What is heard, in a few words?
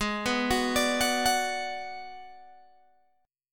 G sharp Minor 7th Flat 5th G sharp down Guitar Open G